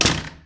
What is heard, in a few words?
slam